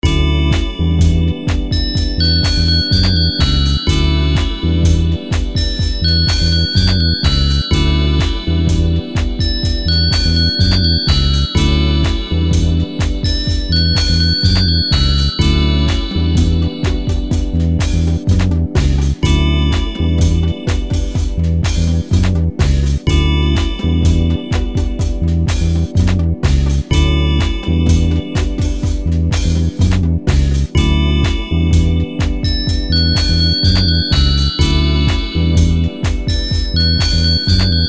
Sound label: mall ringtone